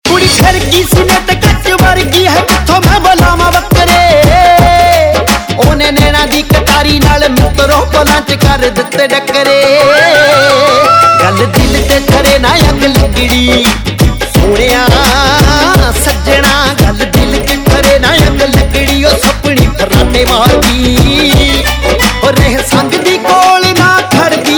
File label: Dhol Mix; Punjabi Pop Ringtone